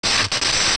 Static; Phantom Ganon; zelda; OOT

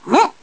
Push Pull; Link pushing/pulling a block; zelda

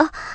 Light Gasp; Uli gasping lightly

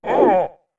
Punch; Goron Link punching, with four different shouts

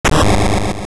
Dungeon Door; A dungeon door opening; MC

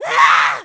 Angry; Link angry (when Aryll is kidnapped)

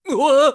Balance Ball; balancing on a rolling ball; Link; Skyward Sword

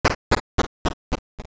Stairs Down; Walking up and down stairs; MC

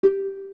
Dialogue Next; Continue to the next dialogue box; zelda; OOT

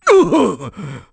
Sumo Grabbed

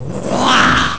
Spirits Roar; Bound Chest; Zelda; MC

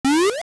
Enemy Jump; An enemy jumping

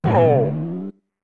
Jump

